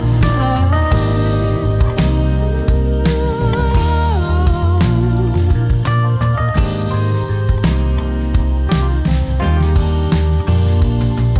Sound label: rhodes